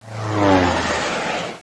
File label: Special plane; mahjongjinghai